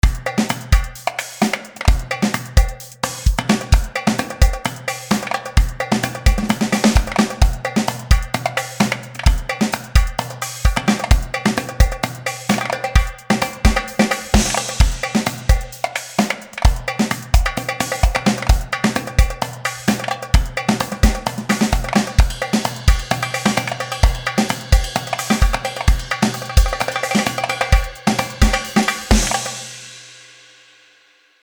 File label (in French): drums et derbuka